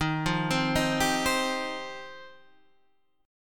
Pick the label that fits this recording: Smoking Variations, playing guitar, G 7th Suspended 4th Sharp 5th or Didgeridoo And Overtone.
G 7th Suspended 4th Sharp 5th